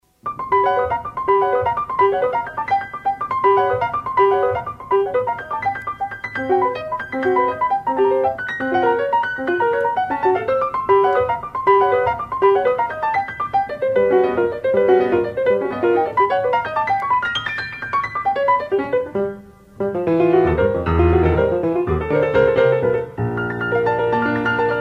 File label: Piano Sonata Allegro vivace e leggero